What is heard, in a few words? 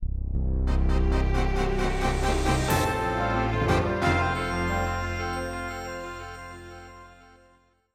Fanfare, Roland SC 55; ms-dos; Stonekeep; windows